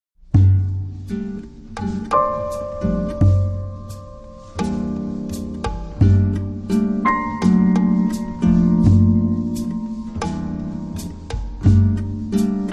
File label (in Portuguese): harpa bossa